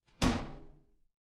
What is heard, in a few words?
impact slam